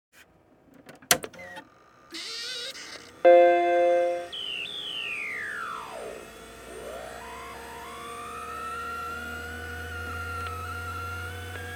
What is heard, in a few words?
mac startup